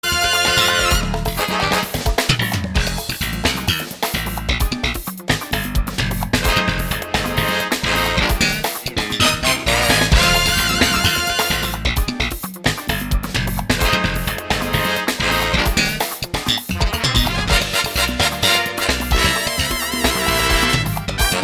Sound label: theme tune